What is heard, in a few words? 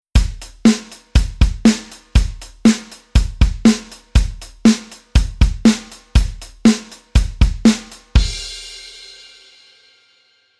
Bass Arr Root Rhythm